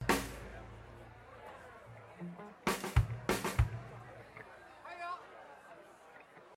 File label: tuning